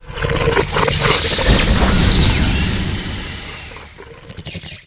zombie attack, monster

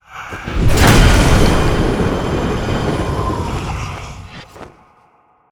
reaper boss; monster

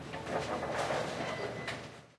mine

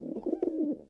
bird, jungle

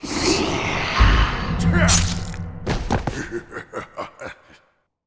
socerer death

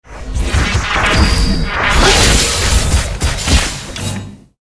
attack

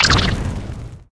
beetle damage

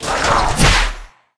death soldier attack

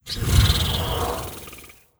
Boss Lighting Horse main

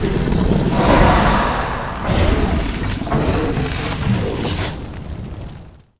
beatle king, monster

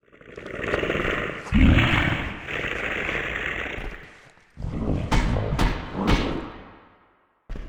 ogre lord idle; monster

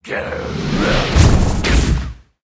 gnoll attack, monster

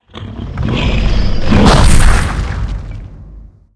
stone attack, monster

